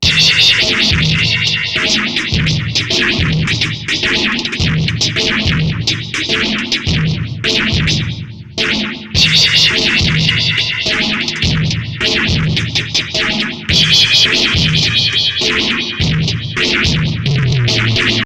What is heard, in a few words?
distorto beat tcxii re